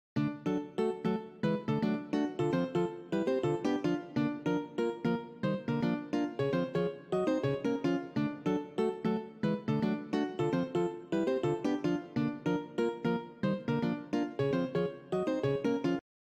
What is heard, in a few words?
cont rhythm example